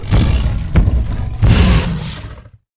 Hippo Mount Play Underlay

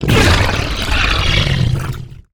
Obsidian Statue Death

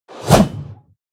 Mining Pickaxe Underlay Attack

